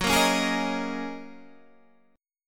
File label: Gb 6th Add 9th Gb, guitar